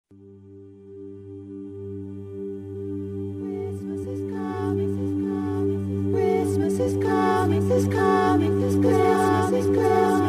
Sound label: a capella, canon in 4 voices; christmas is coming; 4 singers